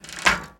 Menu Mechanical

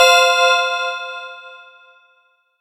chime; blindside

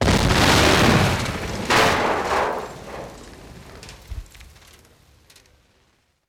Explosion, Tnt Blast; gun and weapon sounds; captain audio; fully loaded

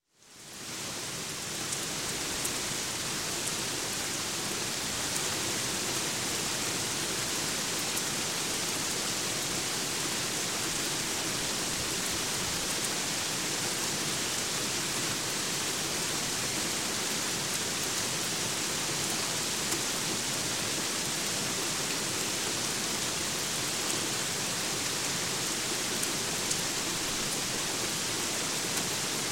Rain, Heavy On Porch; Sound Ideas 2000 Series

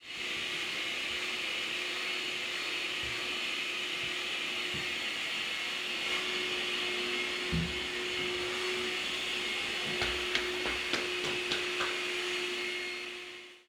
vacuuming; human; NS Studios - ultra sound collection

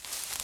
grass; firefight

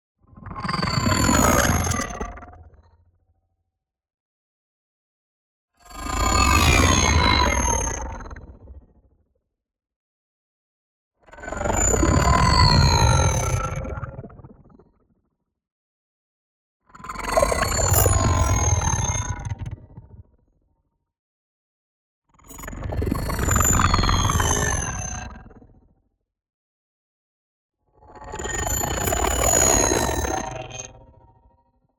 NEON WHOOSH SMALL SLOW Metallic Pulses; boom library

